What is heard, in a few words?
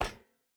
footstep hide metal run; Rust